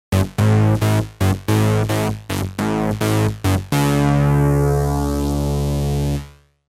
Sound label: Clean Synth